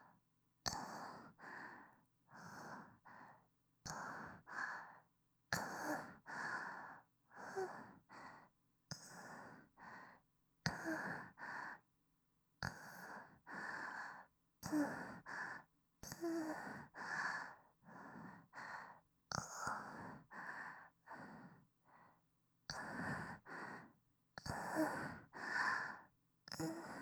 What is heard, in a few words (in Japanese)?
吐息